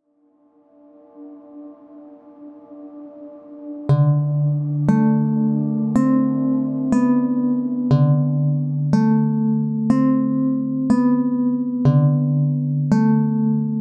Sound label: Ambivalent Ambience